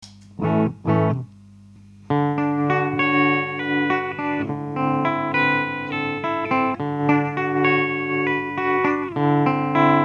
modulation; 3movibr8

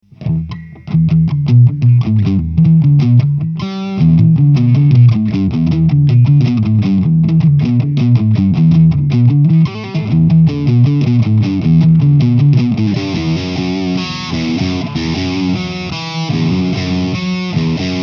med gain, distortion, Nobels ODR-S

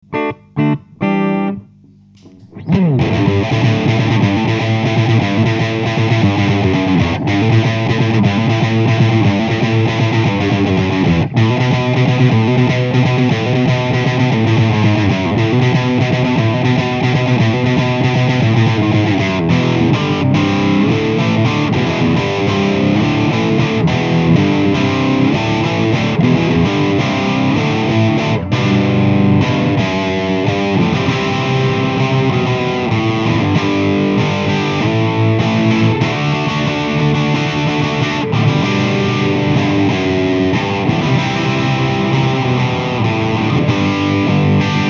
Lo gain, tube

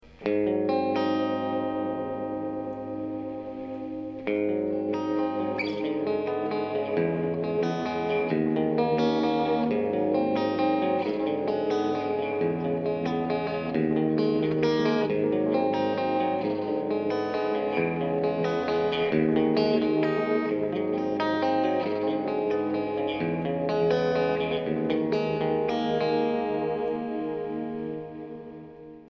Clean picking, UK MKII, modulation

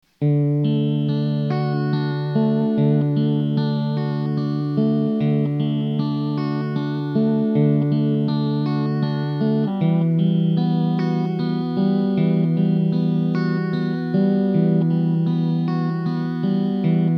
VB-2 picking, modulation

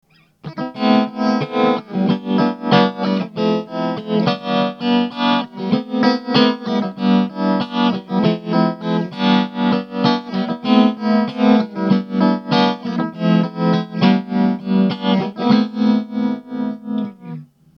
max depth slow, tube, Tremler

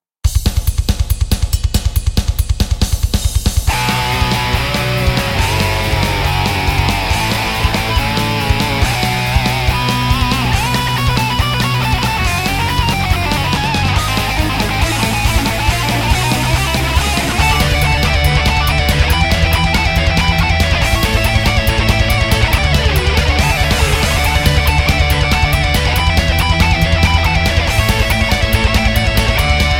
delay, modulation; zimba

